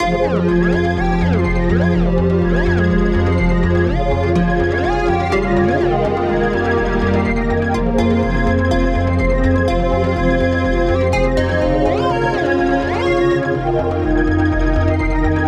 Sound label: Melody Loop Fmin 124 BPM; Trapify; Mystique